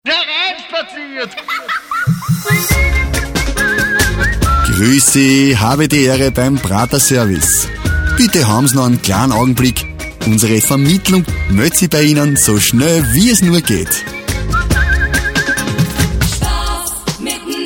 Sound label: music on hold; prater